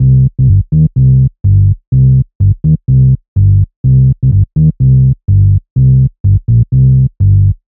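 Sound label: Cherry, minimal; минимал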